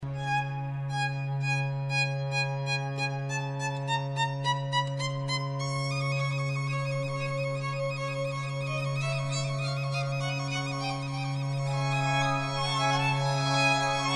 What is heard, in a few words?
vivaldi concerto violin string; op8 no1 mvt1